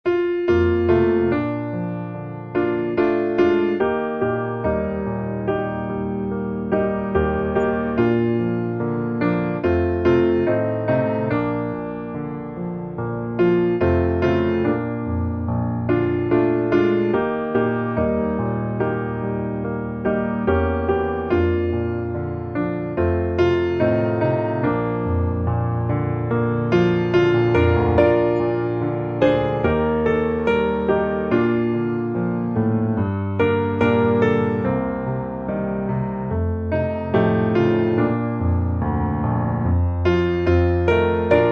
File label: piano